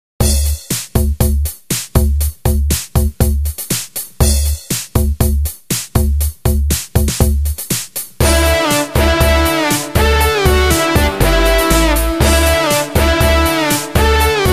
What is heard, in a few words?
pop